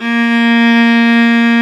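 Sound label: A#2, strings; AKAI S-Series CD-ROM Sound Library VOL-8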